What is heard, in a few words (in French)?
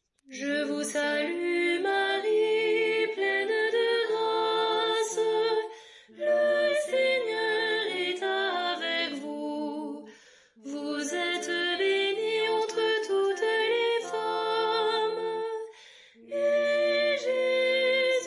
soprane